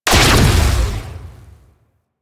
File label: Modular Secondary Barrel Fire; Warframe; SU Modular Guns